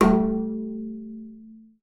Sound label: BING A3, BASS FINGER; Xsample VOL-5